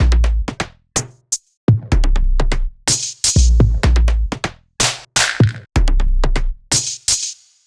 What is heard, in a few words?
125 Steam Beat 707